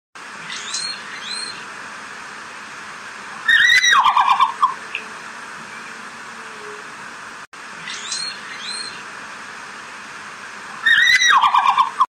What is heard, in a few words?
Red panda; پاندای سرخ